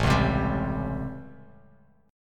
A 7th Sharp 9th, Bass Standard; A7#9; strummed; JGuitar

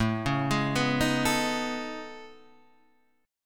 A Major Sharp 11th, Guitar, Half Step Down; AM#11

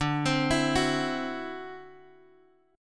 D 9th D, guitar; D9 Chord {X 5 X 5 5 2} arpeggiated

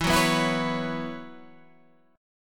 B Suspended 2nd Suspended 4th, E, chord

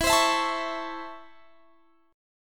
C sharp Minor Major 7th Sharp 5th, chord

